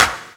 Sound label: CLAP HOU, Dance Mega Drums; Best Service